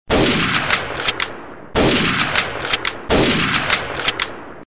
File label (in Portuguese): tiros de carabina 98k